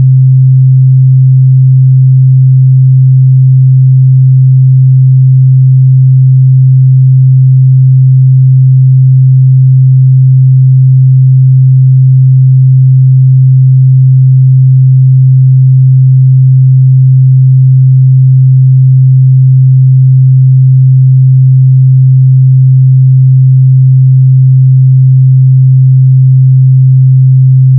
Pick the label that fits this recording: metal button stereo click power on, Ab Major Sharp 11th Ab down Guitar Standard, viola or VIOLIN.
viola